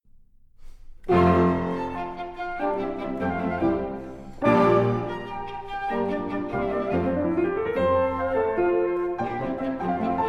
Divertissement TIMPANI

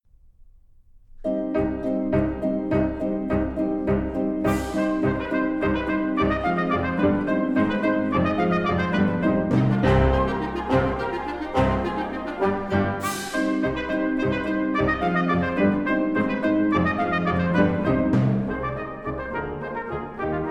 TIMPANI; Iberienne; orchestre

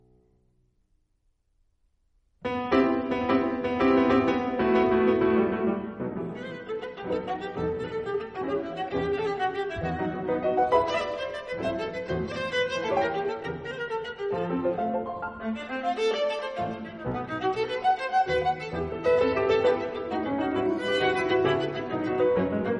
forlane alto piano

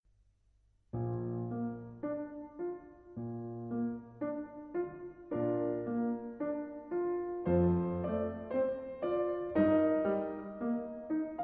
Pavane, piano

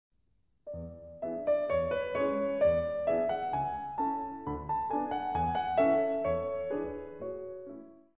berceuse, piano 4 mains

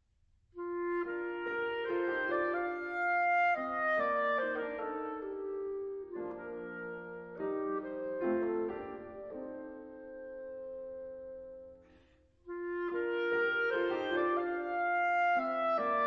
Clarinette Theme

